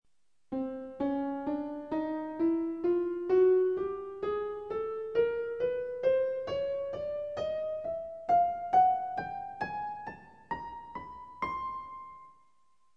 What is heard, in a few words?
chromatic